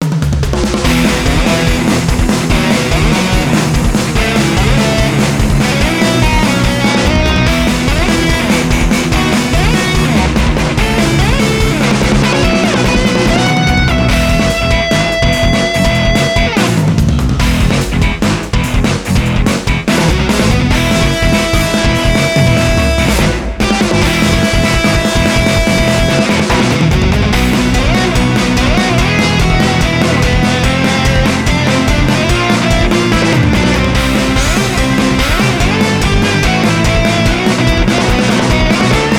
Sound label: BGM Minigame Surfing; Kenka Banchou 6 Soul Blood; 3DS